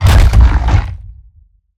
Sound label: Roll Surface End; Evolve; Behemoth